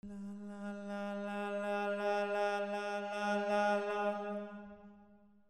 MUSICAL

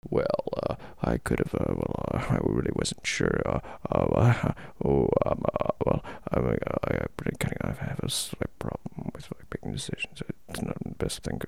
PEOPLE

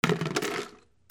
KITCHEN

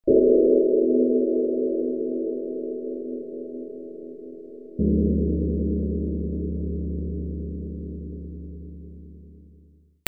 BELLS

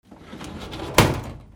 OFFICE

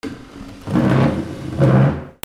HOUSE

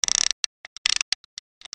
SCIFI

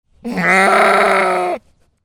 ANIMALS

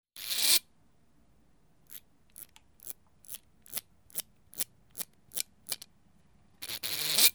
TOYS

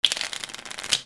CASINO

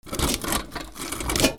GARAGE

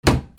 DOORS

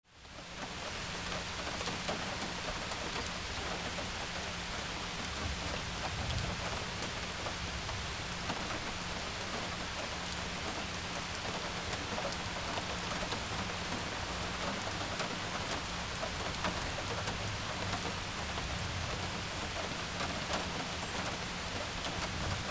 RAIN

